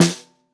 SN Bottom, Snare; Ableton Foundation FreePacks; Snare Designer

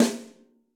Snare Brush Hit Club Sizzle; Drum Hits; Standard Hits; Ableton; Factory Packs; Session Drums Club

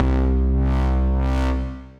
Grin Bass; Ableton; Factory Packs; Vibrations; ModeAudio; Cheshire

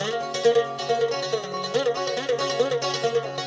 140 Indian Mandolin F#; World

